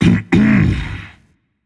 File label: clear throat rage; chaac